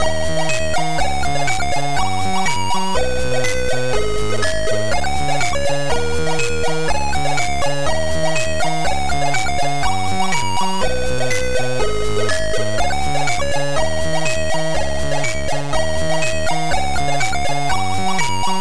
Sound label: BEAT